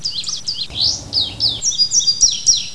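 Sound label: i bunting, indigo bunting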